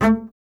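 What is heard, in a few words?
STR CBS, Solo Instruments, double bass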